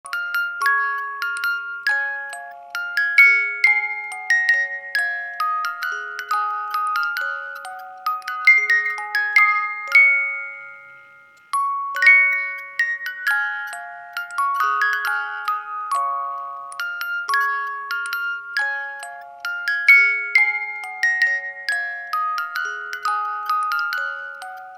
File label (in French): Boite à musique Enfant